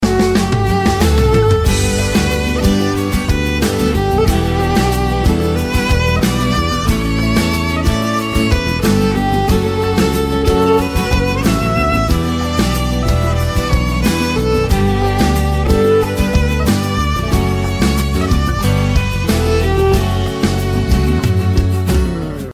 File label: Zang Mobile Torki Ghamgin